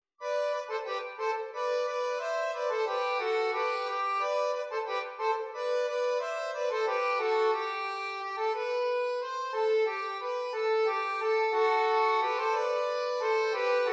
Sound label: valse